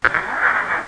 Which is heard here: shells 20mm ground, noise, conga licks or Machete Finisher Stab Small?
noise